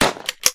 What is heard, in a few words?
Rifle Shoot; Annie